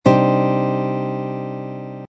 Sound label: c minor added ninth chord voicing, guitar